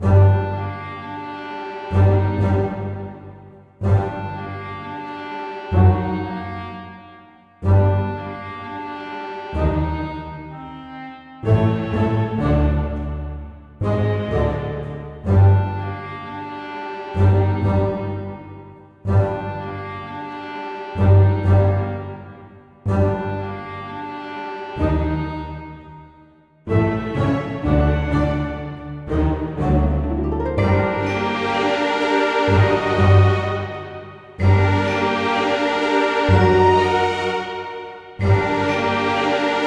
126 4 beats; Cvr Witchsforge; Cookie Run The Darkest Night VR

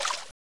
Grabbing; 101-in-1 Explosive Megamix; WiiWare

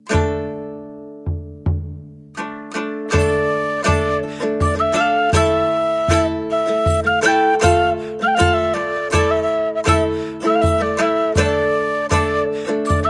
bard kitara drum flute; Song Of The Seahorse (Söngr Lögsóta)

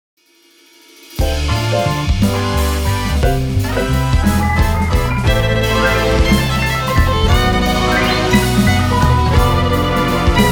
Violin Version; Infinity Nikki; Infinity Nikki Game Original Soundtracks Vol.13

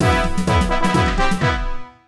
Jingle Level Start; Castle Smasher; Android, iOS

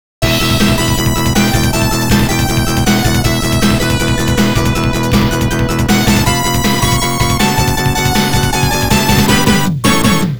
ROUND CLEAR; NIGHT STRIKER NEO SOUNDTRACK -Revision

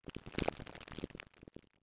Passby Debris

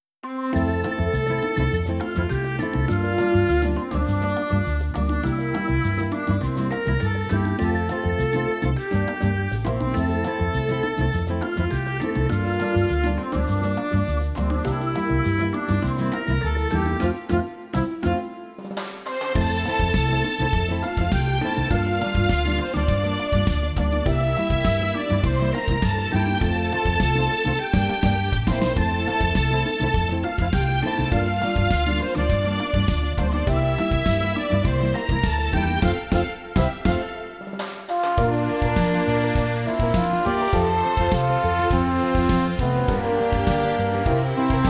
Commercial City; Commercial City of Bose; Trails in the Sky 1st Chapter

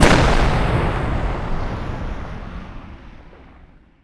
SFX - XPLOD3V; Jeff Wayne's The War of the Worlds

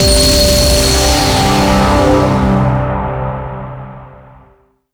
Music Decide Se Default; beatmania IIDX 20 tricoro

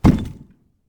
Coffin Open

